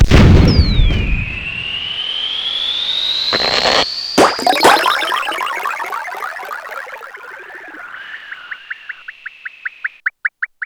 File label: Shooting Powerups